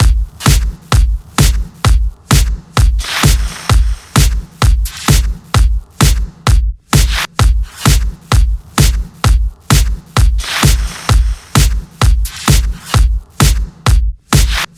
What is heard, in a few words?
129.8 bpm electro drumloop G#, Free electronic drum loop; drum loops